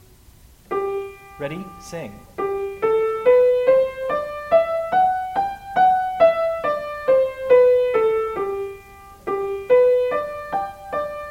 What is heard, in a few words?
G Major louder